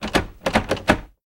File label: Locked Door